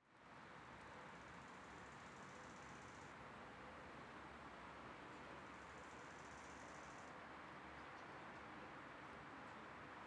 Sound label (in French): Petite Ceinture Vent Pas Gravier Conversation